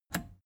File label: plastic button scratch smooth release disable